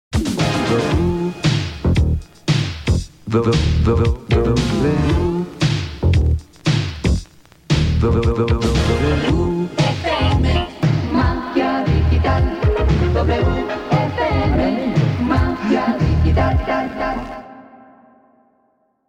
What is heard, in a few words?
Jingle, radio; 1985